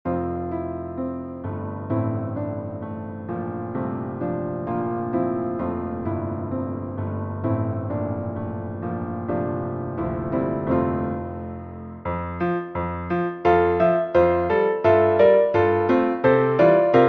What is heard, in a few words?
Piece in F major for solo piano; Varun Sathhya